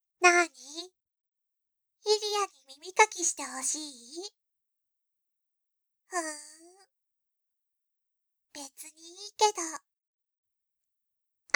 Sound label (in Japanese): 耳かき A; イリヤの耳かき